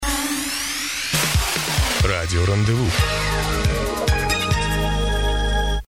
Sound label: jingle